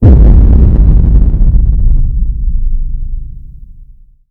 tank death

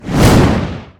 whoosh transform; gwyn

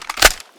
mag in, weapons

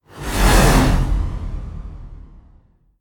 windup

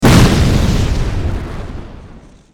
magic bomb

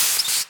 nos stop, car

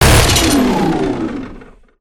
cog turret fire, turrets; gow

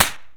flare pop; tools; flaregun

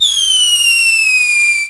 flare whistle; tools; flaregun

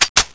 draw; cso; x-90

